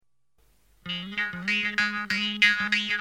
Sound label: funny music